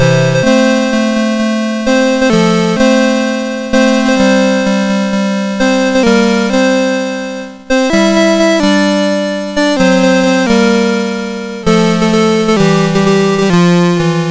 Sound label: MOS6581R2